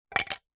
foley wood strip small thin drop or set down hard surface; zapsplat